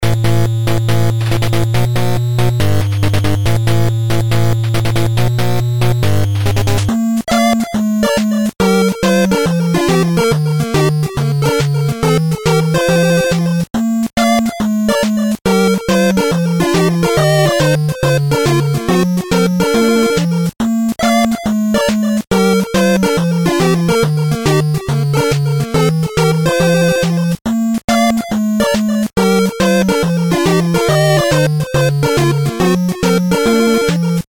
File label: music